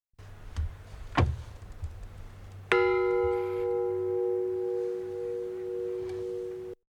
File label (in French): cloche; Chalencon